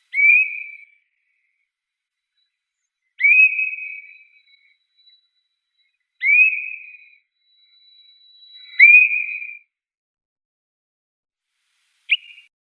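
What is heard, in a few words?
swainson's thrush, catharus ustulatus; drozd svensona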